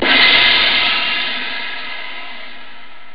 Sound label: cymbal; Groovy Bytes: Behind the Moon; DRM PERC